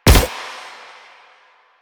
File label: Mech; Duviri; Incarnon Adapters; Incarnon Sicarus Prime; Weapons